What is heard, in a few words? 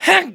kiai; MonsterHunter; Fight